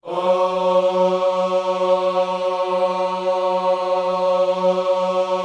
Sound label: OHS F#3, Gospel; Spectrasonics; Vocal Planet Vol.1 Gospel; akai